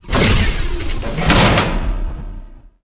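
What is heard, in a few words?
door Hangar Interior; theed; Star Wars Obi-Wan; XBOX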